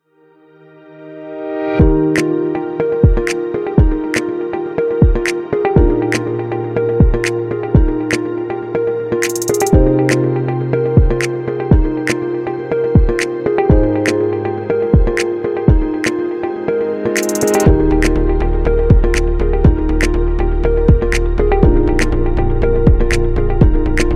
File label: Ambient Tech